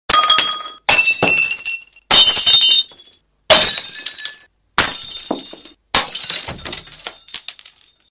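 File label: Glass Broken; Glas Broak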